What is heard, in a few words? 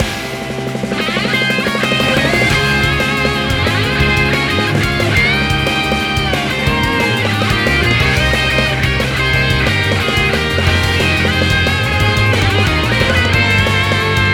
Music event; Music Game General